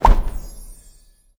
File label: Pop Up